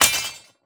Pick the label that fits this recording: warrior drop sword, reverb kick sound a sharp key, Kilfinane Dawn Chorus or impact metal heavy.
warrior drop sword